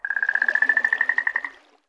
Jungle Maximize; Win98sounds

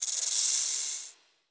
Jungle Menu Popup; Win98sounds

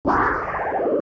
Robotz Close; Win98sounds

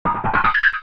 Robotz Question; Win98sounds

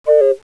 Musica Restore Down; Win98sounds

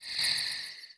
Jungle Asterisk; Win98sounds

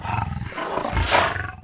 Jungle Exclamation; Win98sounds

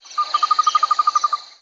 Jungle Question; Win98sounds

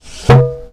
boop; Win98sounds

